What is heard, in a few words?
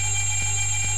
psychic; mew